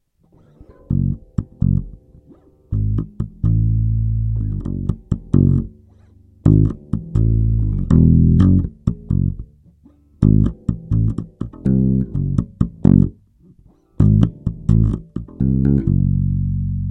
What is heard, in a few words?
bass sound sample bridge; jormungandr